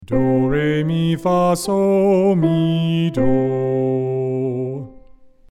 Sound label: do re mi fa soh